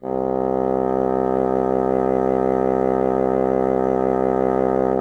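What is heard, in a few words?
wind bassoon C0; Miroslav Vitous; Solo Instruments VOL-1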